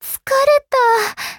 fail sound

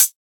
hihat

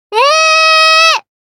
combo break; osu

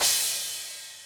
Starting C-Cymbals

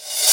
Cymbal Reverse

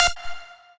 lead

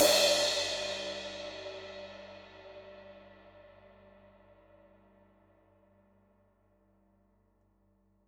crash; osu

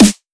normal hit whistle

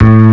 bass; osu

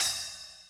Open Hihat

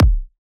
kick

